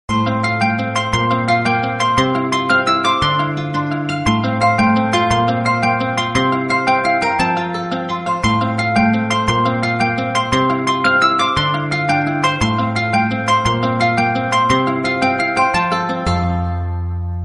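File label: Samsung Note 9 LG Optimus G Pro, ringtone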